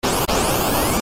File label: encounter; ff3; ff3us